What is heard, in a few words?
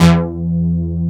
PROPHET5 SYN, SYNTH; East West - Global Sounds; USA SYNTH